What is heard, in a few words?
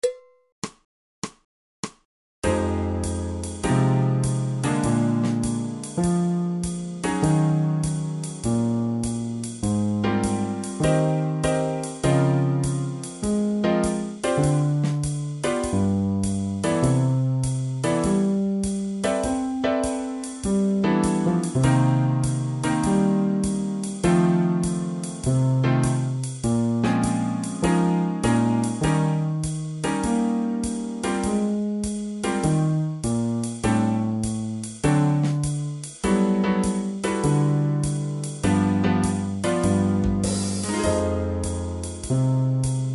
2beat, fretless bass